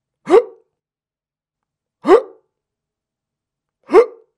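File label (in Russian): звук икоты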